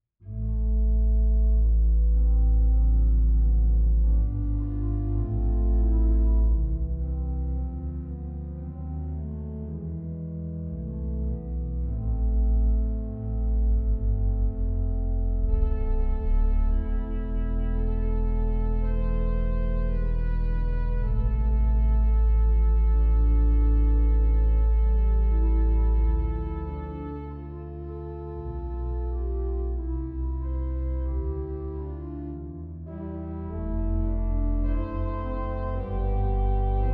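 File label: Chorale Prelude, organ; 1860; Hill; Berlin; Best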